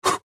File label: Punch